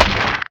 axe mining; factorio; boompuff

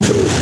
stomper death big, enemies; Factorio

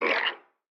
night frogs; factorio

